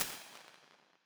Mixed Impulses - different responses in each channel